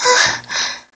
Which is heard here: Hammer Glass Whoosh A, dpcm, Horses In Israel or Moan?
Moan